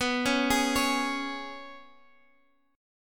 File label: G sharp Minor Double Flat 5th B